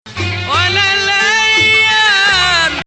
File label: Bayati Rast